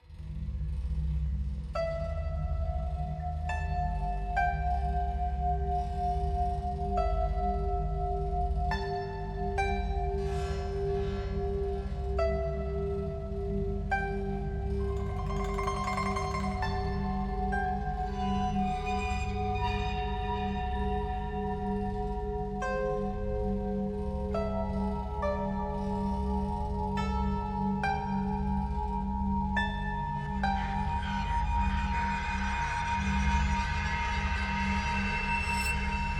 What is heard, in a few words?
main menu music; full demo